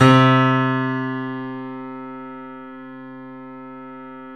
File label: C, 3-D Audio Collection, 3D Pianos, Yamaha C7